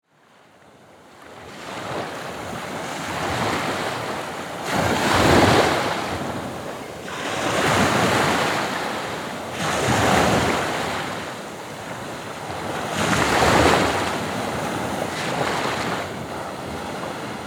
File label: waves, beach; nybrostrand